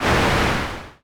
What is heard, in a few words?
giant footstep